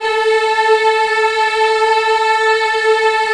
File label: A3 WOMEN EH, Choir Acoustic & Synth; USB Soundscan vol.28; AKAI